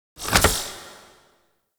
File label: Orokin Derelict Vault Key Insert; Warframe; Doors